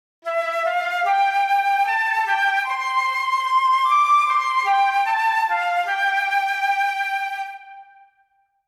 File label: The Poke Flute; pokemon let's go pikachu and let's go eevee